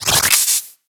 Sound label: Health Pickup; Warframe; Pickups